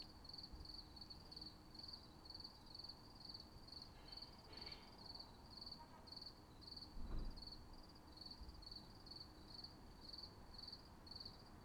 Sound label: crickets; Crickets at night, Auray.